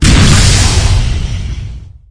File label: Vehicles; SFX Vehicles; Scorpion